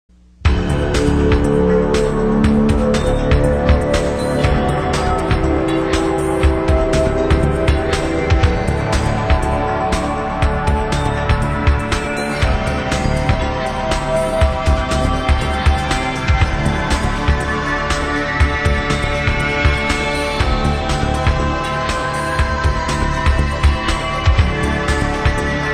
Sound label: Techno